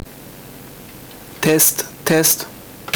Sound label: test, background noise; Noise Gate function from AIC3105 codec; custom board